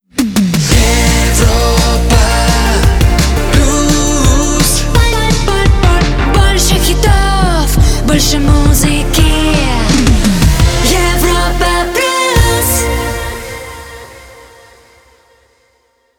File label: Jingle; Europa Plus 2022